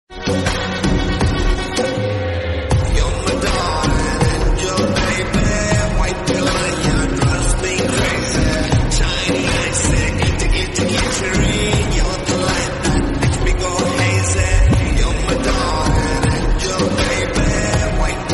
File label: slowed reverb punjabi